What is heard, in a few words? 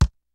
kicks snare, kid; Kid Nepro; Elite; KICKS SNARES